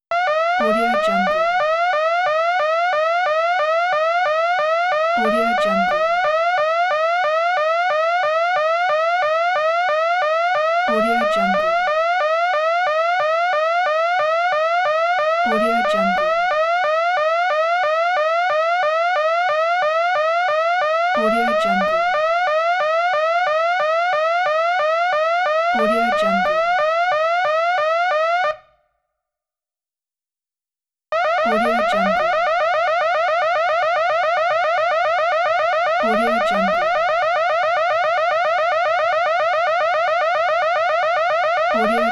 alarm siren; sounddivision

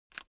object headphone retract single short

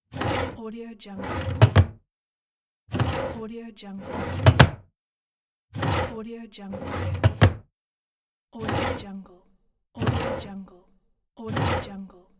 the drawer open and close; fx market

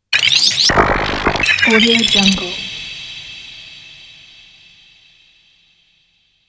glitch

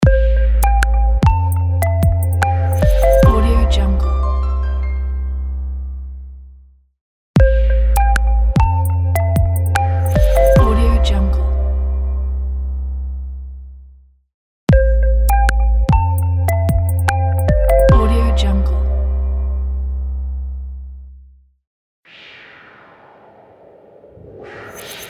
clean elegant logo

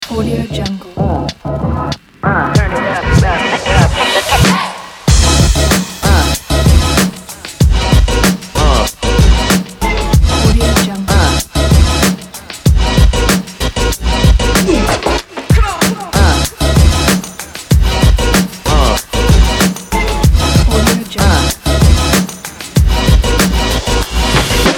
hip hop

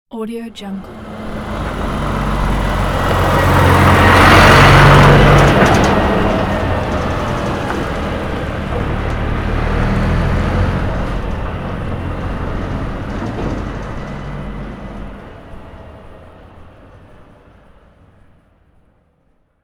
very close dump truck passing

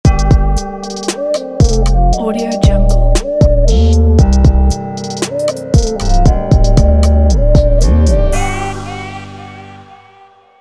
darkish trap logo

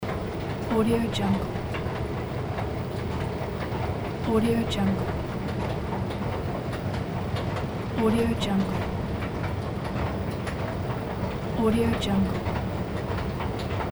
escalator loop; greenwaysound

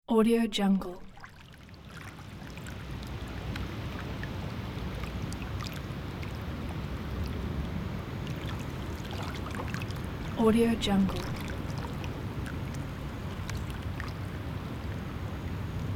lake

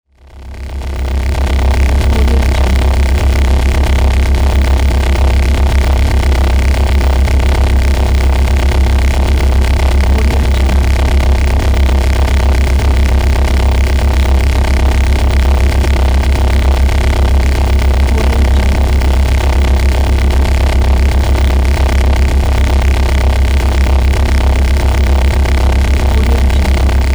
power engine drone

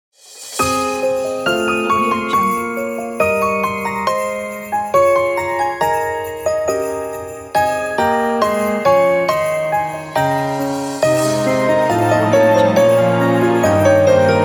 christmas angels we have heard

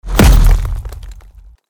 hit to the ground

